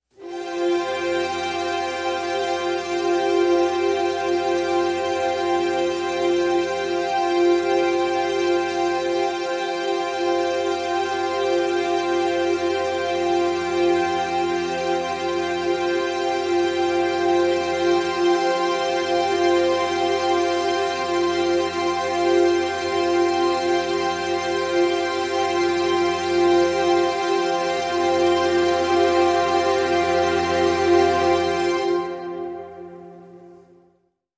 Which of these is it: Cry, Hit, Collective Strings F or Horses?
Collective Strings F